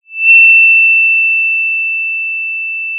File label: pop, Sound of a school bell